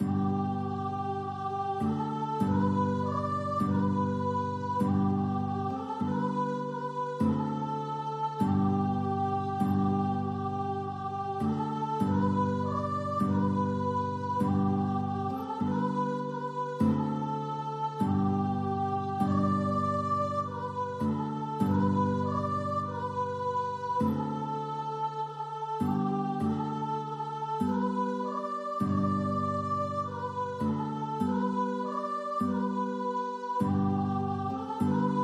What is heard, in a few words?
voice guitar; blood of jesus; guitar and vocal